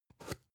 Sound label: spice jar big scratch open fast